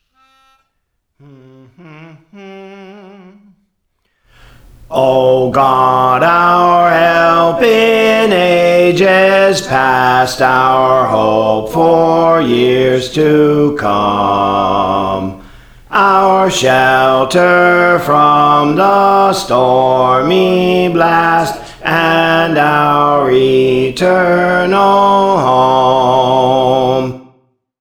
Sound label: Bass, Songs; Praise For The Lord Training; O God Our Help in Ages Past; St Anne